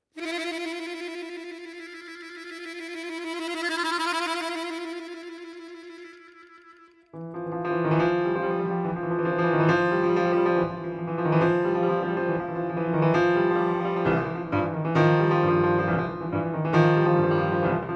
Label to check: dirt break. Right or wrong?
Wrong